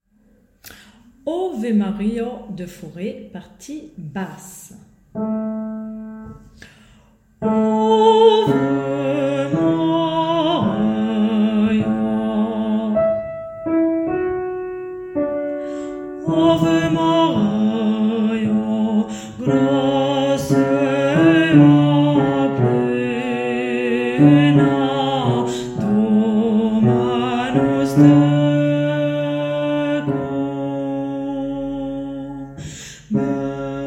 partie basse; Ave maria; fauré

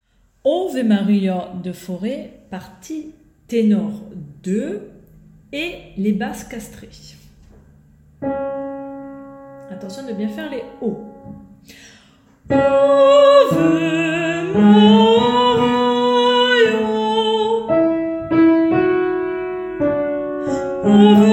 partie tenor; Ave maria

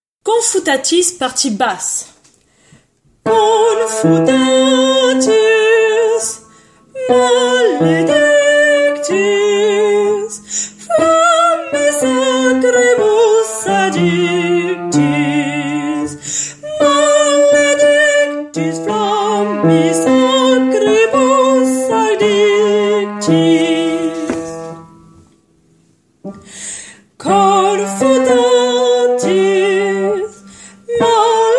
Requiem Confutatis Basse; Mozart

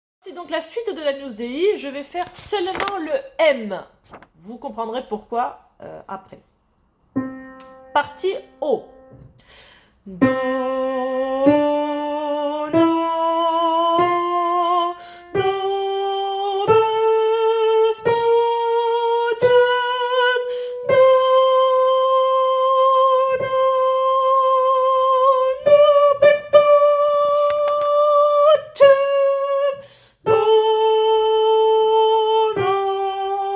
tenor haut; Suite agnus dei M

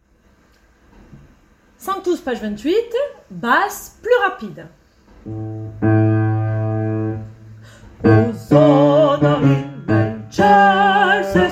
basse rapide; Sanctus p28

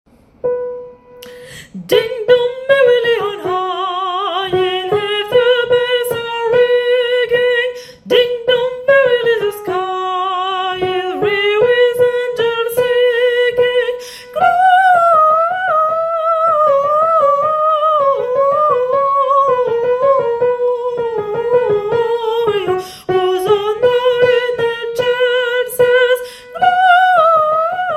partie soprano; Ding dong Merrily

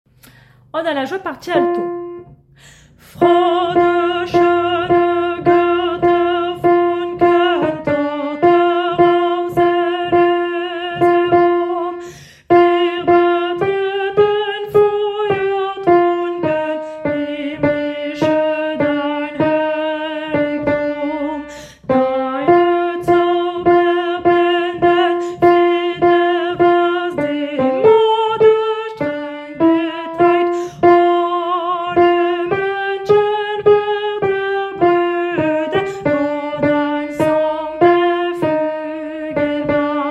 partie alto

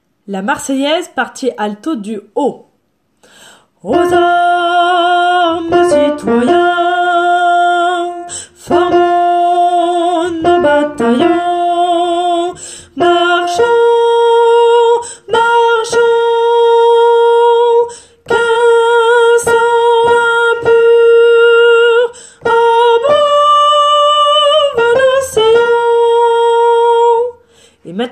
alto haut; Marseillaise

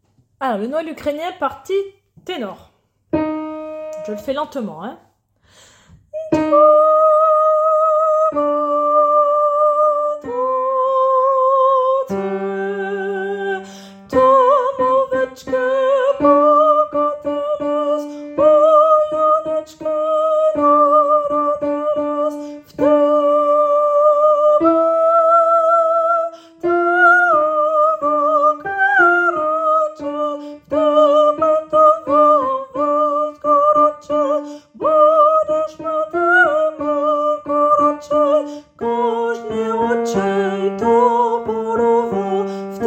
partie tenor; Chedryk

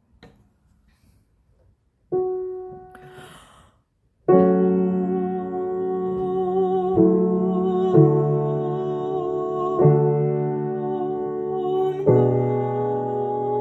soprano

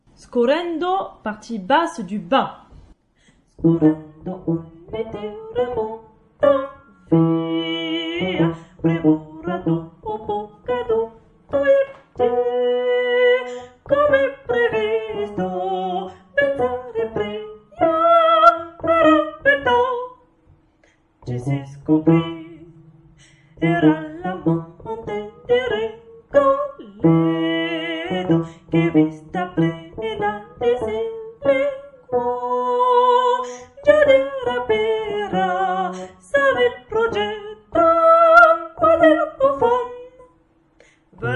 Basse Bas; Scorrendo; Rigoletto; Verdi

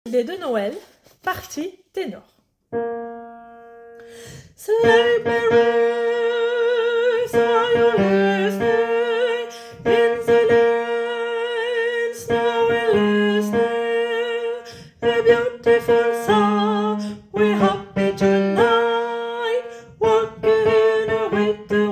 tenor; Debut medley de Noel

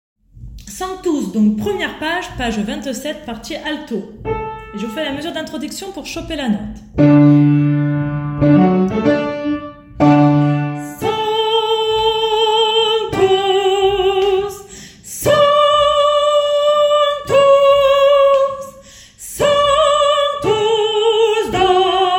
partie alto; Sanctus début

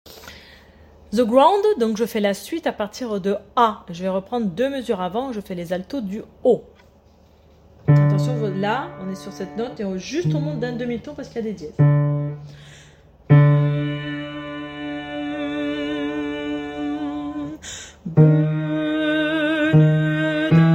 Alto du haut

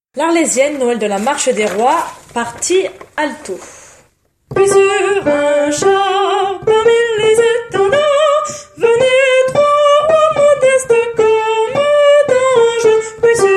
La Marche Des Rois Alto; Bizet

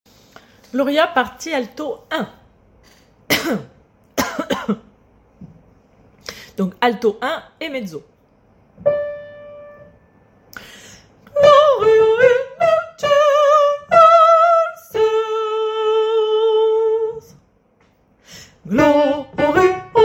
Missa Gloria Alto 1 et mezzo

